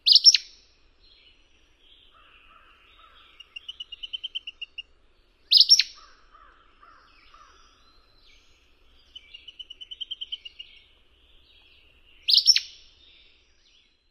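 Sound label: songs and twitters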